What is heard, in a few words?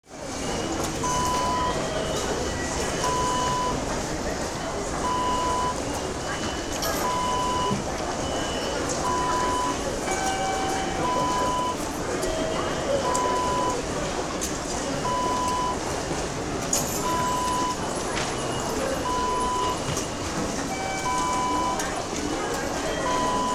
metro station